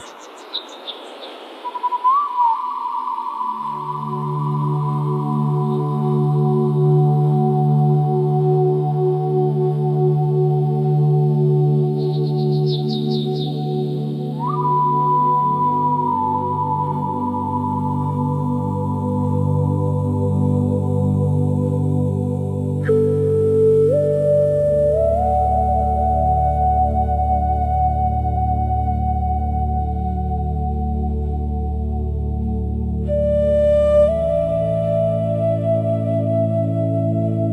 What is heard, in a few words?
instrumental; 48000hz 24bit